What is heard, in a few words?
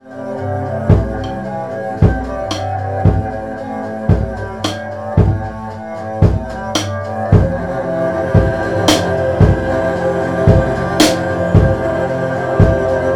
Drums mix